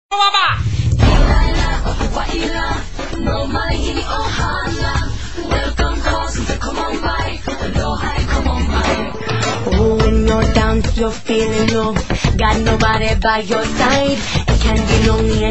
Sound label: ringtones; lilo stich